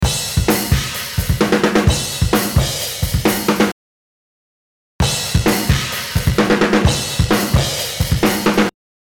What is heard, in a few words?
drums